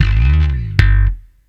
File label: G slap slide, Steinberger; InVision Interactive; Lightware VOL-1; Instruments & Percussions